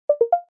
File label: success, phone tones; LG GD570 dLite